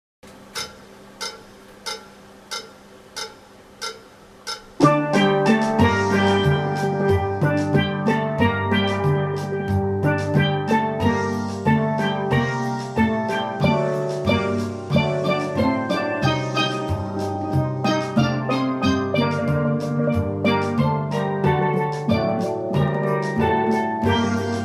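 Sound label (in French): TUTTI HARMO 3 VOIX 92, Steel Band; BELLA CAO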